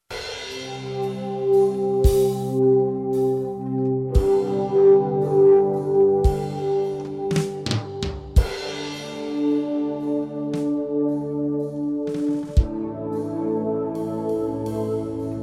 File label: A mellow space rock jam